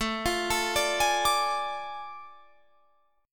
A Major 7th Suspended 4th Sharp 5th, Guitar, Drop D